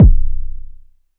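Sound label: vermona drm1 mk3 bass drum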